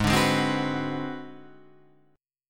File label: Ab 7th Sharp 9th Flat 5th Guitar Half Step Down, chord; Ab7#9b5 strummed; JGuitar